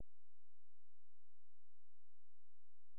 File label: silence; speeddvd